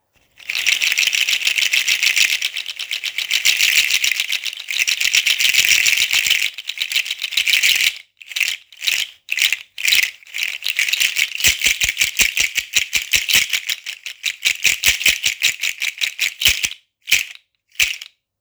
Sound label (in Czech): juju orechy; juju orechy s putkom